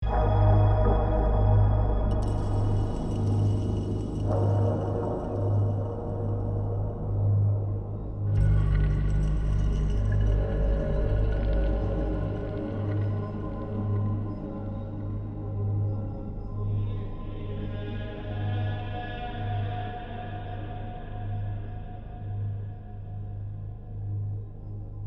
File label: Sewer Ambient